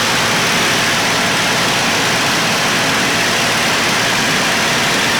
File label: Plane P40 Inflight Loop; Airplanes